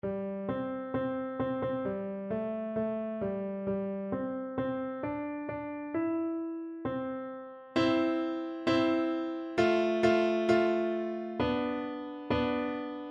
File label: Bingo PNO, piano